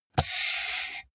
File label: computer mouse draw drop